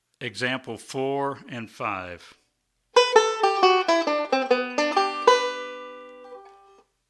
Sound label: Scales And Arpeggios